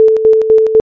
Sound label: volume saw 6 step 4hz